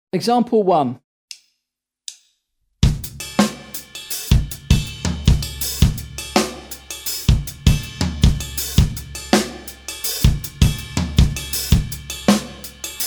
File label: Drum set